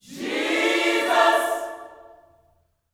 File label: JESUS CHD, Vocal Gospel